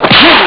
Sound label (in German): schlag oben; Amiga Soundtracker sample